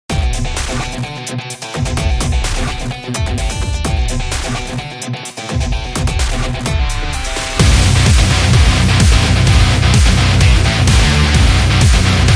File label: Metal Modern Rock